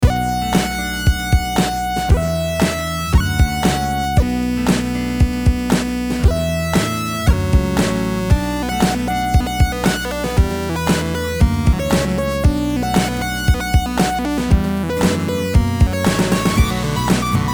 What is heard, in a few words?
tight saw